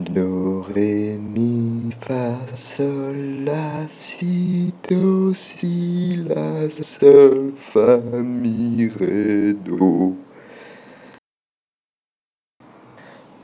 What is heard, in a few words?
doremi voice